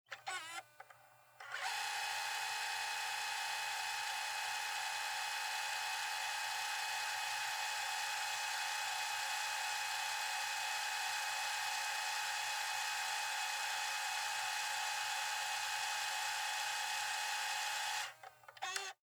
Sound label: Technology Dv Camera Fast Forward Panasonic Dvx 1080 Camcorder